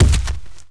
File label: walk; Vehimoth